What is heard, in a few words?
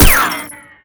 rico glass, ricochet; Modern Warfare